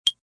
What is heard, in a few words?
Cheep; Chirps